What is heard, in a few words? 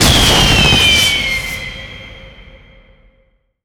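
fireworks launch; ambient; Left 4 Dead 2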